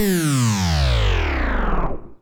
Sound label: Synth Dive; Sound FX